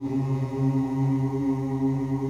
D1 MALE CHOIR; CHOIR OOS

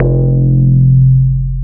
bass, 160 BPM, drum & bass

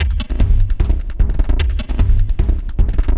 LOOP, 150; Masterbits - Tekkno Trax; D LOOPS

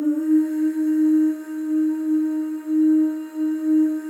FEM OOS, Symphony of Voices; Spectrasonics; FEM.2 OOS

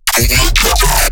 glitch stab; Virtual Riot; Glitch Stabs

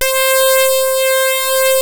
saxy lead; Rubber Chicken Software Sample Bank

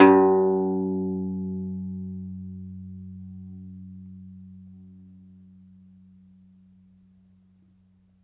DOMRA, Segundo Domra, Plucked Strings

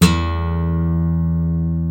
GUI Ac. E2, GUIT AC; Best Service Gigapack 2

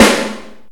SNARE E3; Greytsounds VOL-1; SDX SNARES

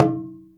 WAYANG KULIT E5, Heart of Asia; Spectrasonics - Heart of Asia CD2

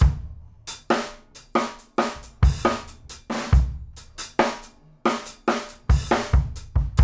GROOVE, 60-85bpm; E-MU Producer Series Vol. 8 – Platinum Phatt

